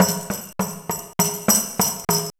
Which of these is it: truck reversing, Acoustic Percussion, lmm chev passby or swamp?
Acoustic Percussion